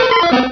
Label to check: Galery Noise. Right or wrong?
Wrong